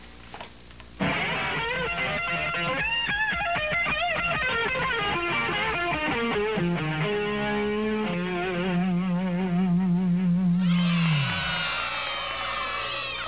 RIFF

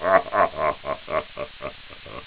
laugh, More wicked laughter

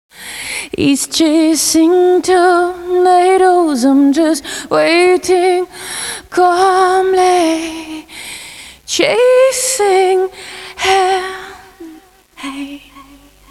tornado

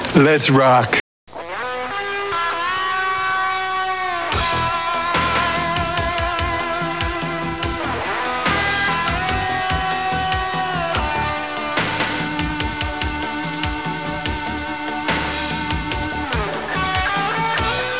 sob

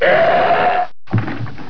PUKE

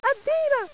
takeoff

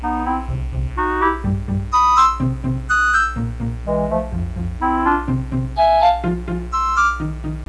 castle, Zelda 64 Sounds

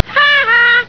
haa haa

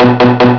Bonk bonk

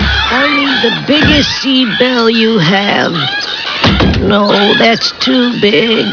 seed bell

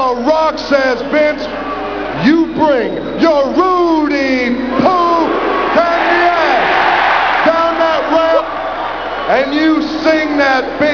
rock

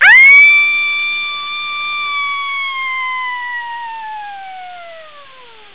scream, A girl screaming

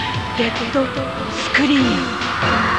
dead scream; Sailor Pluto

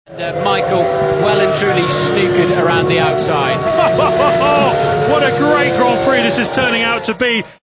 hoho; brazil 2001

